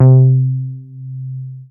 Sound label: C3, BASS; Q Up Arts; Dream Experience; BASS BANK 7